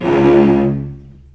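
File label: C#1 arco long cello, right; East West; The Ultimate String Collection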